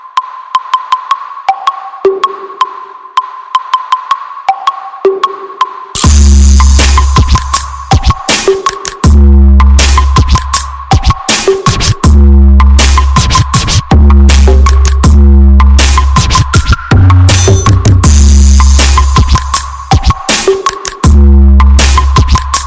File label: Subwoofer Bass